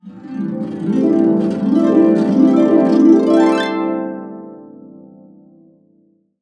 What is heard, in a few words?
C CASC UP DN, Harps